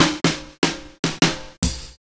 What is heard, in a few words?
FILLS, 70_s Breakbeats